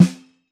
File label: Brass, snare; Drums; Ghosthack - Free Snares 2023; Blk Brass